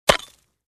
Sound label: Smashing stone block with a pickaxe